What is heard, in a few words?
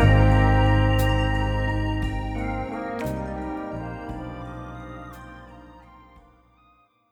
Midnight Murmurs - Harmonized Fadeout, Pads; BB 2HTC Samples Vol 4